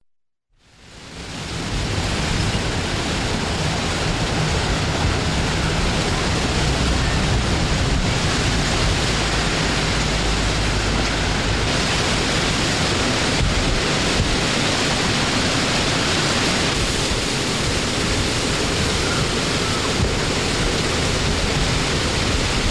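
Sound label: wind blows through tree foliage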